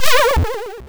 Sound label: falling